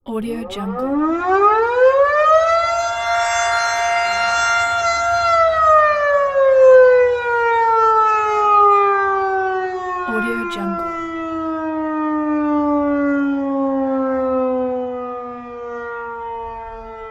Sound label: siren alarm